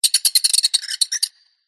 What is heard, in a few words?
squeaky metal Designed Alien Bird; PMSFX